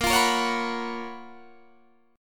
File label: Gb Major 11th